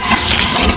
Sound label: golem damage; bradium golem